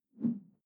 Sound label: whoosh simple short tonal low; swooshes-swishes